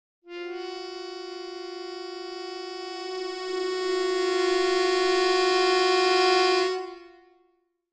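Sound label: Accordion Keys Tension; keyboards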